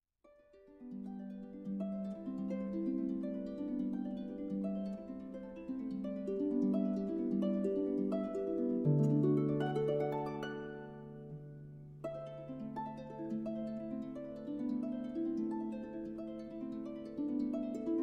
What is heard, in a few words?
Harpe; Delibes; Morceau À Déchiffrer Pour Harpe